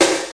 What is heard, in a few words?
piercing snare drum e key